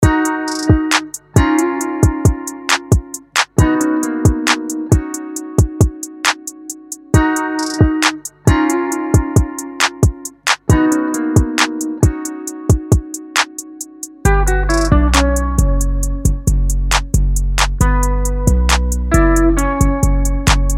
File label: Guitar loops Elec 135